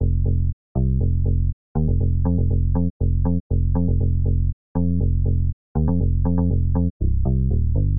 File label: BASSLINE, BPM 120; baseline junkyard